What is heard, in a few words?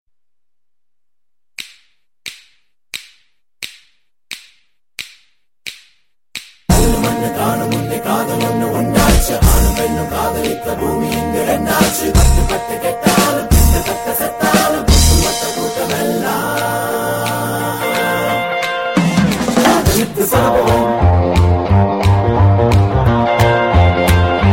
Music